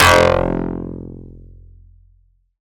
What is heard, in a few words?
bass F1, Casio VZ10M; Polyend; Simulacrum Sounds; 1 Shots; Synths